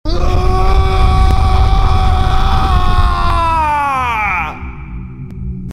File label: scream man